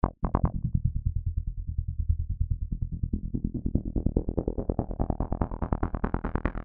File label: Arp Bass E